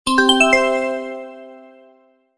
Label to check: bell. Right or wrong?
Right